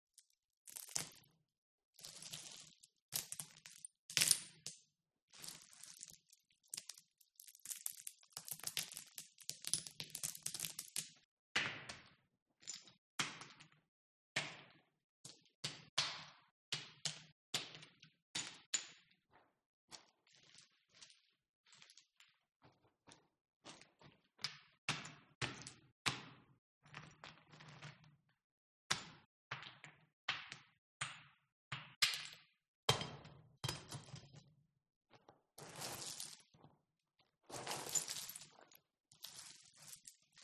foley rocks stones various drops impacts sprinkes int cave natural reverb